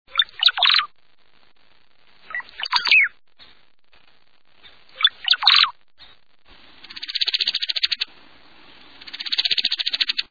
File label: Brown headed Cowbird